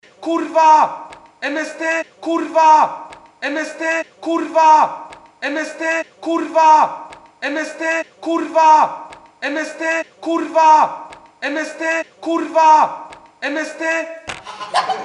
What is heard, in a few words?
HAHA